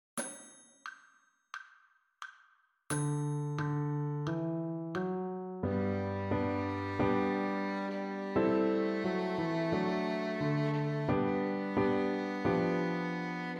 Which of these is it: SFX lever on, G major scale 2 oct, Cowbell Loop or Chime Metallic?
G major scale 2 oct